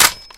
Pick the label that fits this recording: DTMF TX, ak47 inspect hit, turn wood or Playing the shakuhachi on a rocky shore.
ak47 inspect hit